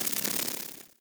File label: Critter Scatter Clicky; Ambience; Misc Outdoor; Warframe; Infested Eris